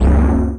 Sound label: Shield Generator Hit